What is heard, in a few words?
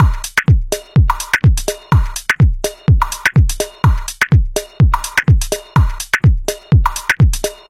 Beat Loop Abm 125bpm